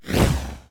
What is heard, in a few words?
Void Fireball